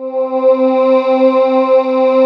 CHORAL PADS; Pro-Rec - Synthsonic; Z1 PADS

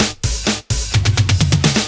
Fills 128BPM, Clubsounds

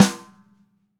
Ludwig Black Beauty Snare F Room; Sonic Foundry; Sony Creative Software; Vital Drums; 1969; Snare One-Shots

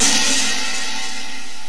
ohh; jazz jackrabbit 2; M8

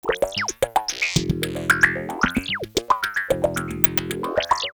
In D, 112; X-Static Goldmine

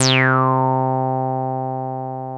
303 C3, TB 303, Synthesizer

